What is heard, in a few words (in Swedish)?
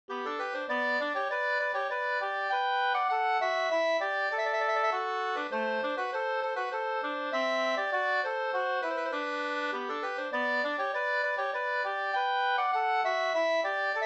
folkdans, polka; Zigenarpolka